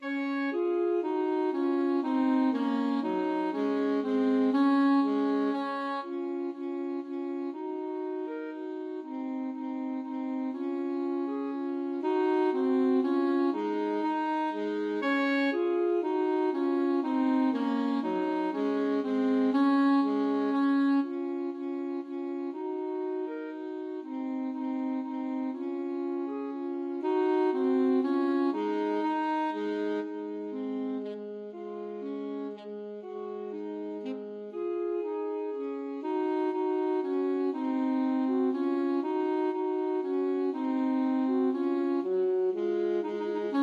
Menuett alto saxophone duet

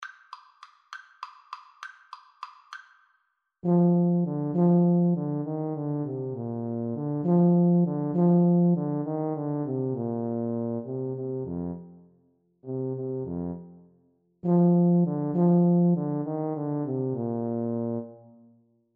Tuba duet

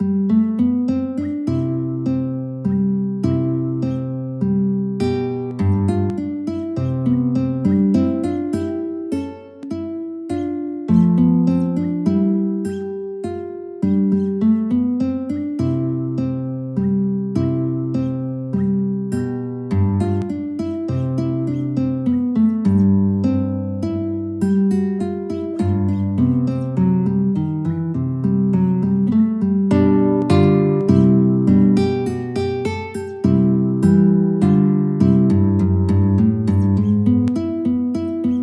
Sonatine Larghetto guitar; Carcassi; Sonatine1

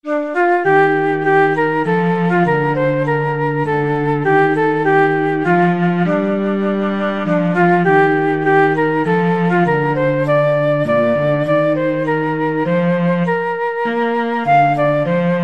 flute cello duet

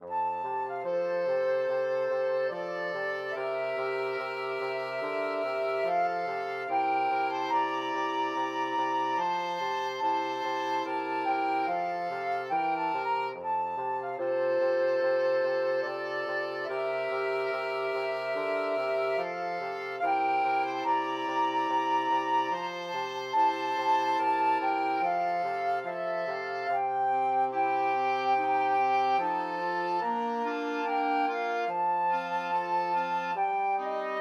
flexible mixed ensemble, 4 players

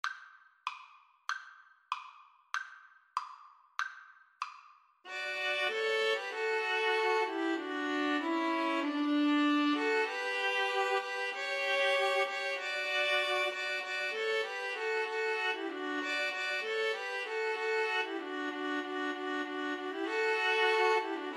string trio

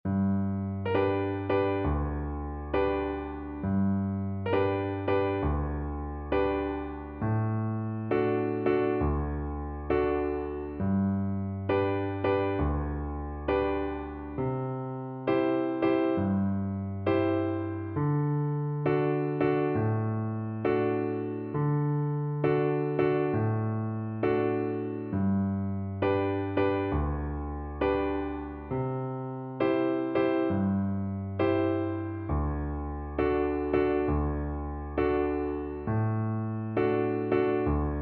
man of constant viola

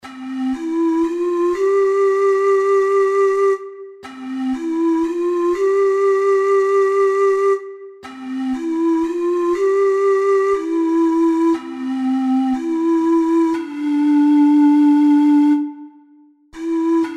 when the saints, ocarina four hole

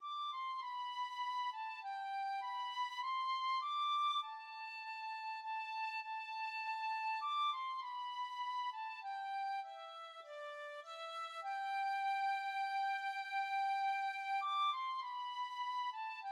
southwind, tin whistle

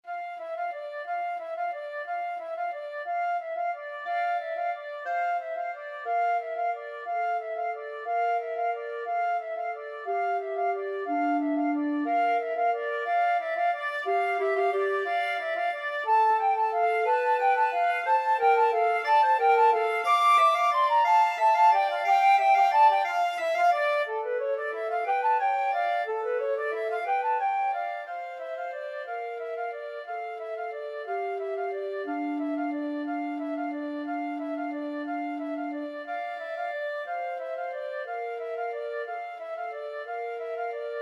flute clarinet duet

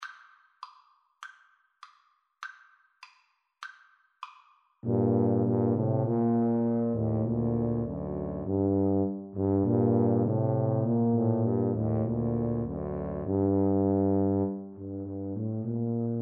crown roses, tuba trio

